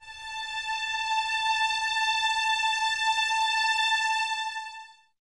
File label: String Violins